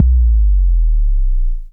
FX Boom

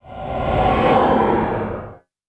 Reverb Athmospheres, FX Vocals; Vengeance Samples

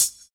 Closed Hihat, Cymbals; Closed Hihats

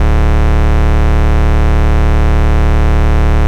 Bass D, Root D

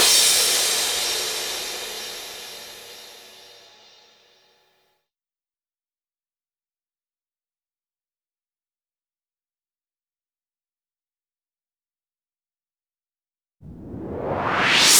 128 BPM Rap Let's Move FX Mix; Vocal-Hook Kits

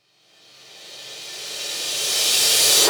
Reverse Crash; Cymbals; Vengeance Samples

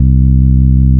Basses C; Vengeance Samples

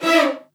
Synths C; Vengeance Samples

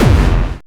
Bassdrums Hard Kicks; Vengeance Samples

